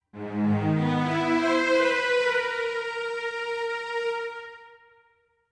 strings

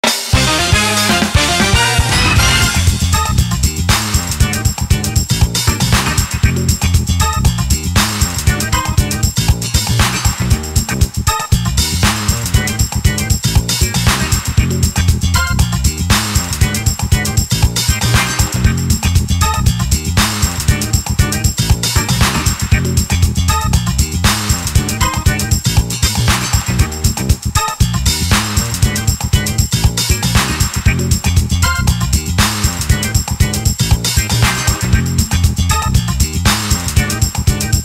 Bonus; Gran Turismo 2; PS1

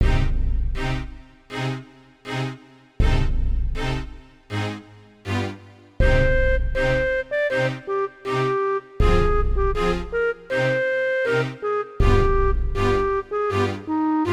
(Sans SFX); Banjo-Tooie; N64; Spiral Mountain

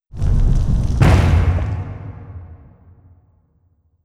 rush entrance door close; Hollow Knight

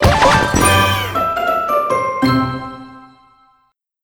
Crunchy Finish

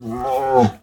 whimper

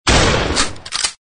Player Shoot; Acid Rising

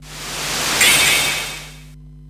Magic Ice; Etrian Odyssey III HD

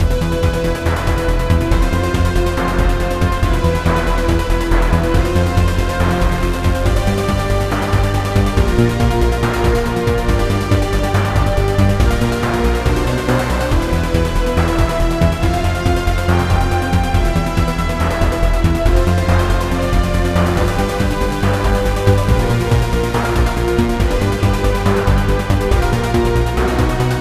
Battle Sequence

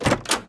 Door Opening; friday the 13th killer puzzle

